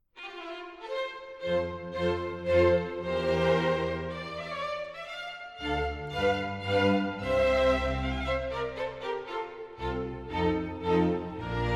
Concerto for Horn and Orchestra in F Major, Allegro moderato; Bohemian Horn Concertos Havlík, Stich-P